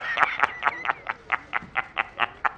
laugh; jjjameson

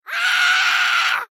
scream

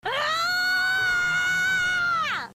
war cry; shiranui flare

